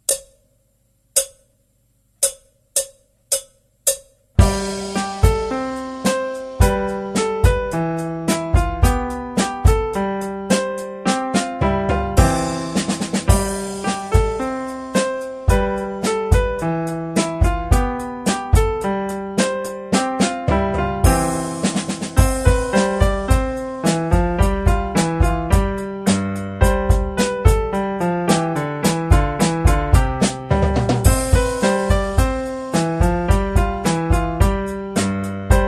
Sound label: drumming sessions